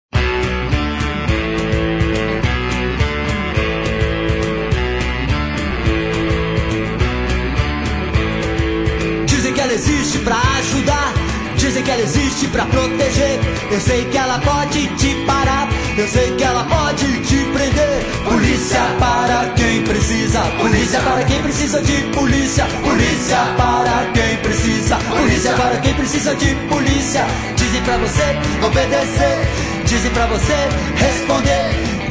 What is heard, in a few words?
Trio Acustico